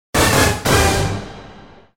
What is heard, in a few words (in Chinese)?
死亡倒计时（结尾音乐）; Zombie Rollerz; Pinball Heroes